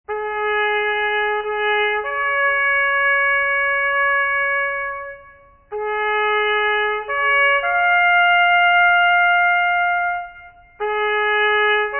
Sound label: Bugle Call Taps; armi